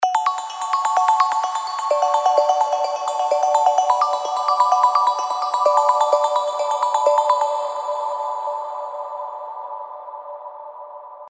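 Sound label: F#min (128 BPM), progressive arps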